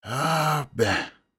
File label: ahhhhhhhhhhh biiiiiiiinnnn